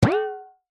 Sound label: cartoon impact hit high pitched hollow; zapsplat